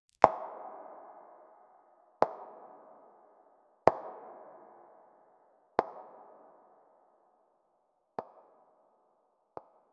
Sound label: slow high heels reverb; fashion